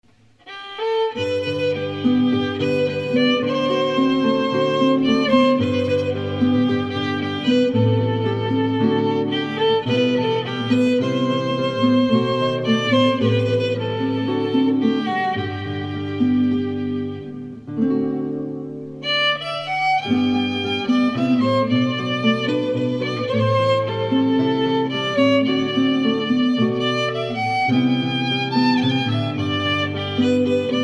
arpege bearnais

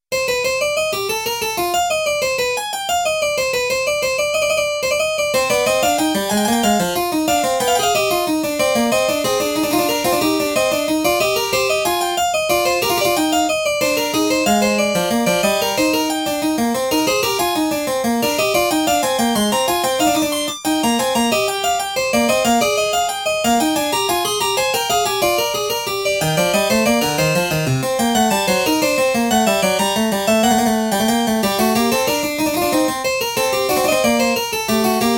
clavecin; bach johann sebastian; 15 inventions a 2 voix n 14

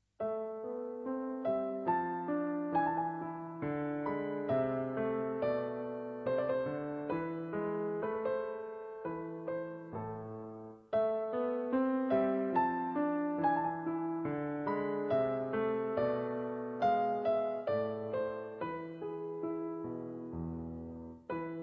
sarabandes clavecin; Rameau, Jean-Philippe; premier livre

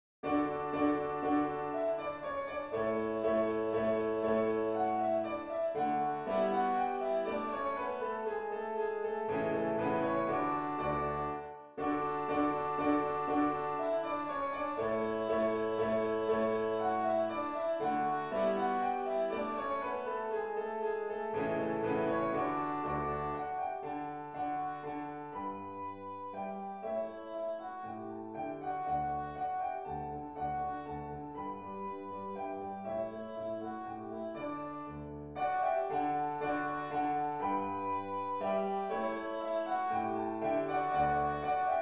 danse allemande, ré majeur; haydn joseph

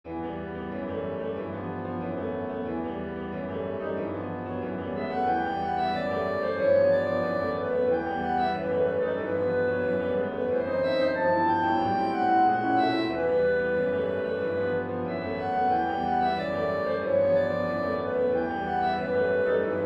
romance pour clarinette piano; Baudelaire

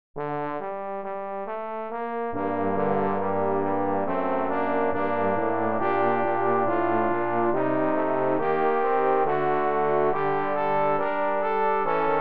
trio pour trombones accompagnement

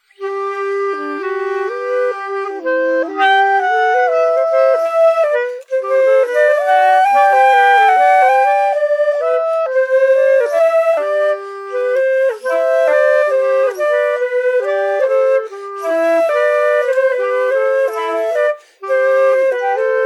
duo pour clarinette

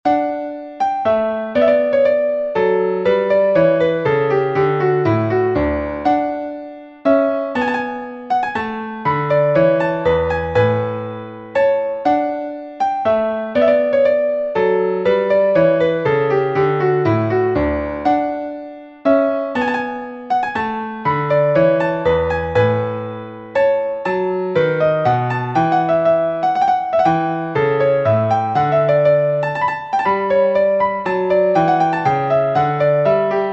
menuet; berg george

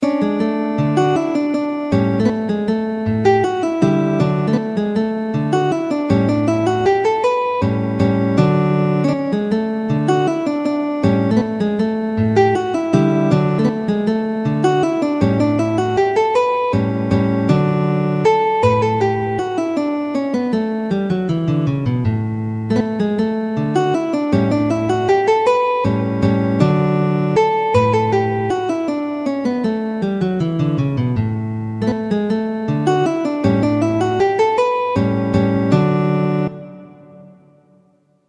valses; legnani luigi

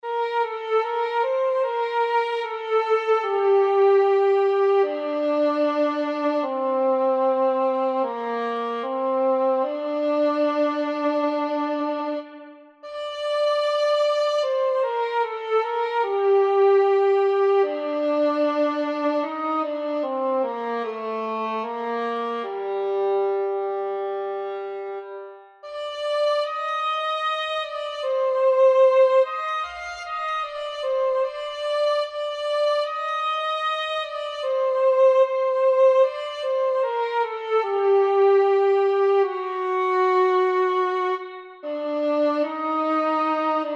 solo de violon; en hommage a ma tante

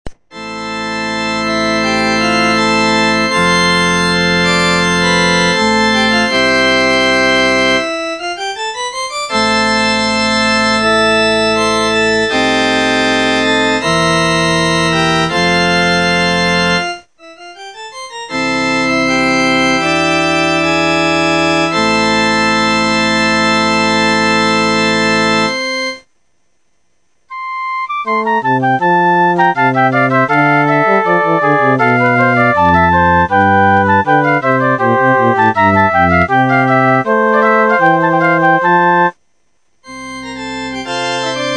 suite francaise pour orgue; laffay jean francois

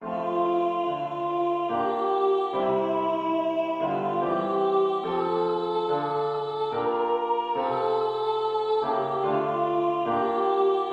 hymne national anglais

